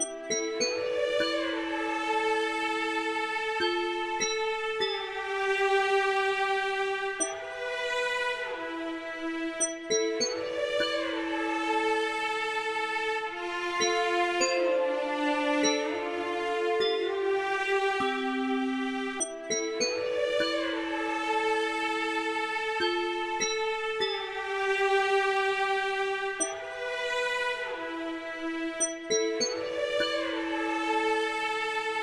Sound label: Cello Glocken; toki tori 2